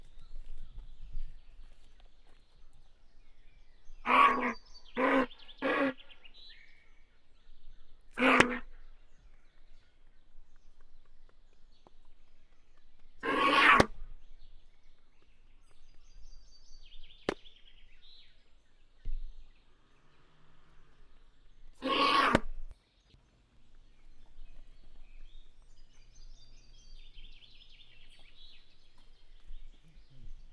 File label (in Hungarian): mikrofon után kap a csőrével, rózsás gödény; jaszberenyi zoo 2015